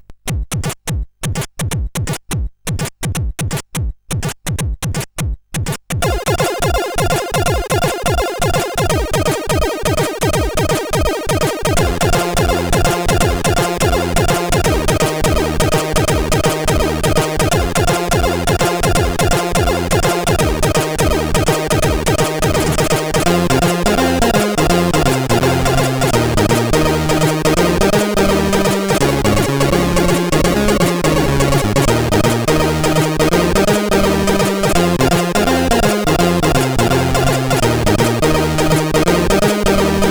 SID Jig, CSG8580R5

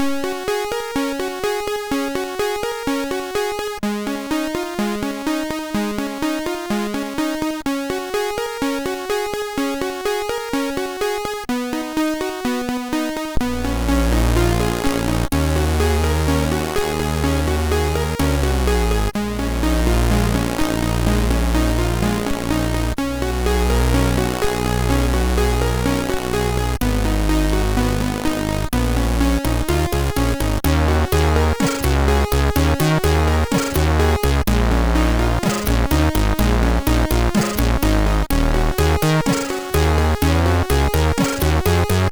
Music, MOS6581R3; Shit

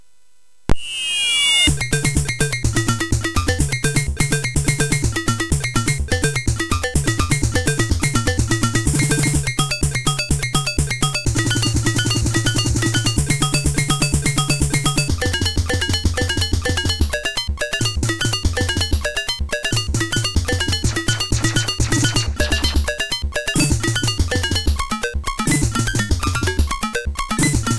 Music Demo Version, MOS6581R3; hvsc

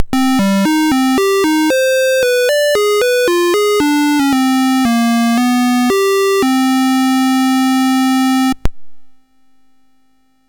Dragonworld sid MOS6581R4

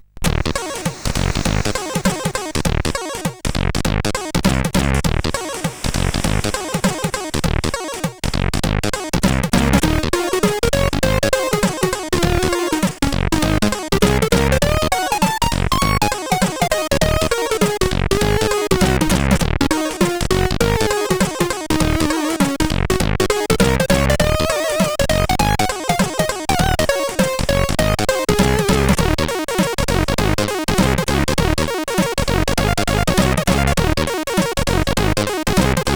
Jazzy, sid; CSG8580R5

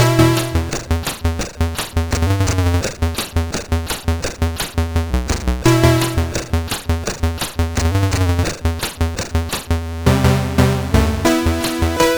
Paddle Mania, CSG8580R5

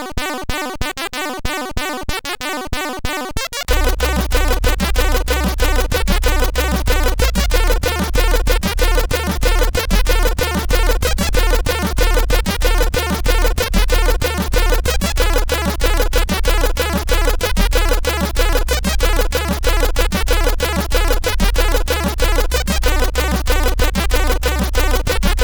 Techno 93, CSG8580R5

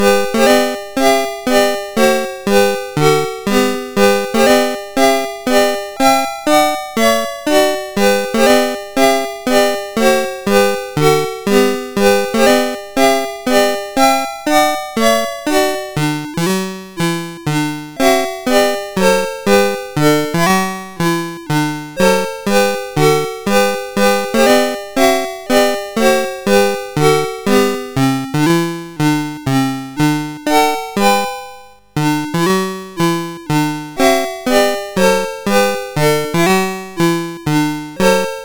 March, sid MOS6581R4; Soldiers March; Master Composer

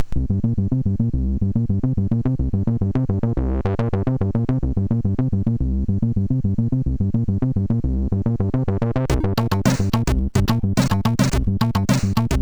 Truck, MOS6581R4; PAL

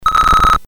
sid 8580; Bowling BASIC

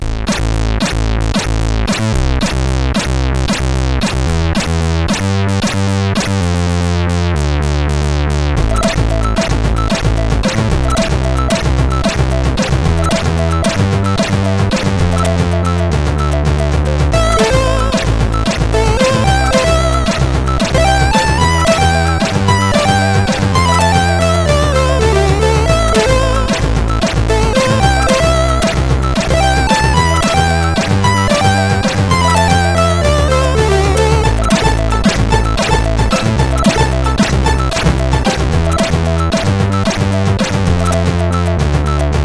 remix, CSG8580R5

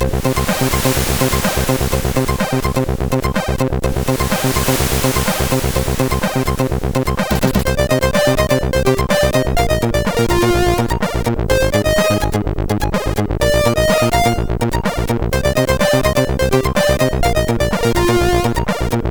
sid, MOS6581; Take Over

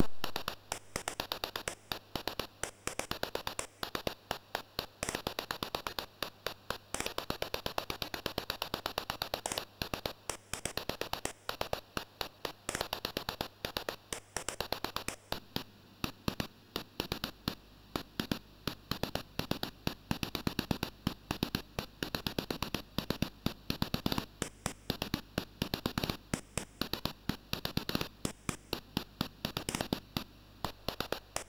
sid, MOS6581R2; Stepper

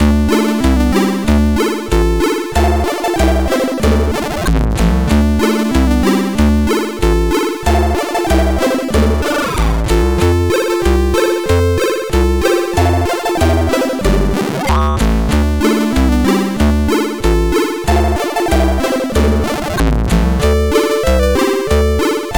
Gametune, CSG8580R5; Gametune 15

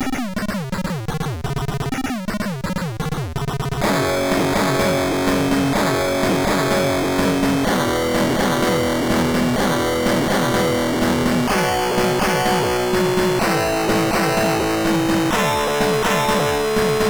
Hard Rock n Riffs, MOS6581R2; Selles Ward

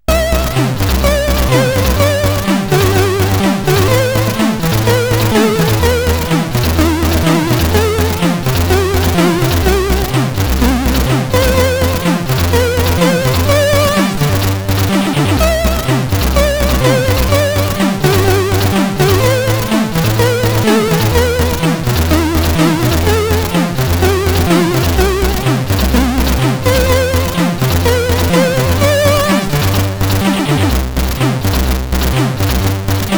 Russian Anthem, sid CSG8580R5

